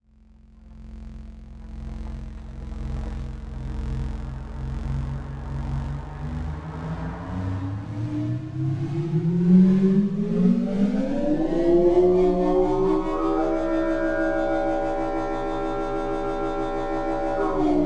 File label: scifi; krotos igniter